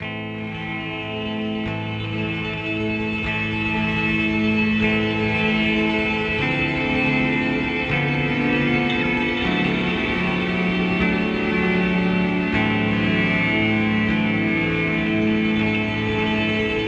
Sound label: EQD Afterneath Reverberator